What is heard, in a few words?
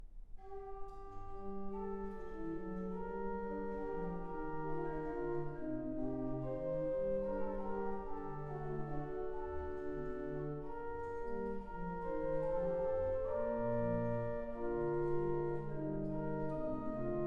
Variation; Sei gegrüßet, Jesu gütig, BWV 768 - Variation VI; J.S. Bach Chorale Partitas, BWV 766-768 & 770